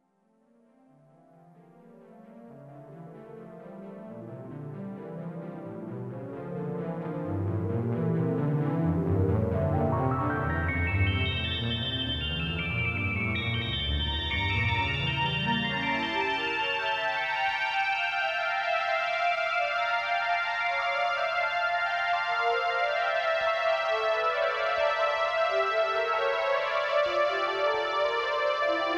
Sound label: Displaying arpège; Musiclassique; axiel-son